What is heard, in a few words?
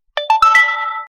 notification xperia